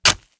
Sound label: croc get hit; Immortal